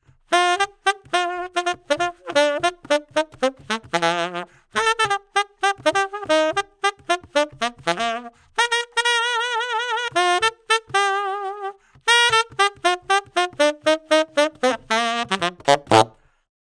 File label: sax audix adx20; Test mikrofon